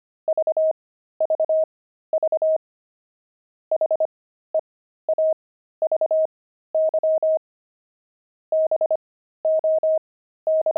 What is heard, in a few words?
pangram, intermediate pangrams files